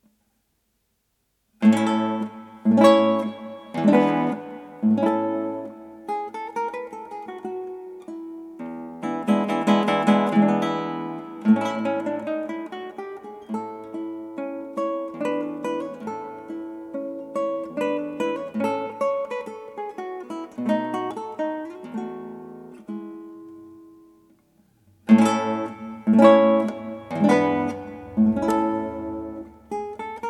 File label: Menuet